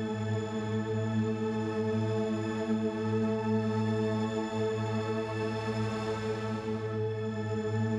Drone; stereo toolkit samples; Non Tempo Loops

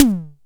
SDS TM, tom; Best Service; Real Mega Drums VOL-1

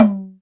DM5 Perc, Alesis DM5 drum machine

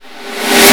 REV GATE SN; AKAI S-Series CD-ROM Sound Library VOL-1

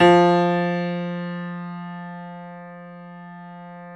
F2 YAMAHA L, GRAND; NS GRAND

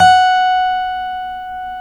folk steel, guitars

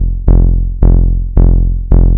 Bass Line; Eclectic Electronica

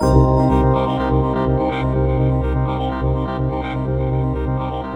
Korg, FX

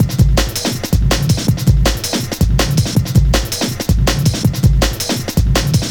162, breaks, Loops; M8

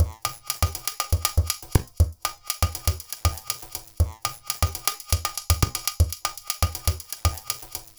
Minimalism, Processed Percussion

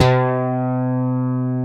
BASS MIX, DANCE

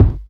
BD; Best Service; Dance Mega Drums

